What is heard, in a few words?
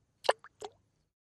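Splash Sml Pebble Plop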